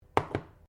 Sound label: JAR PLASTIC PLACE BENCH; Various kitchen jars; Set Down Sound Effects; Set Downs (Sound Effects:Objects)